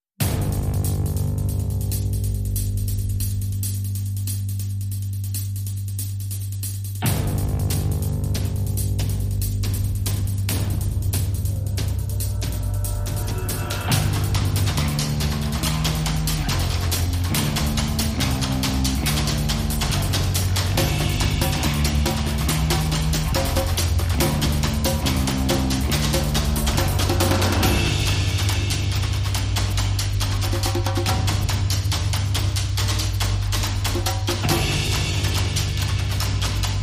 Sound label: Drums and Bass Mix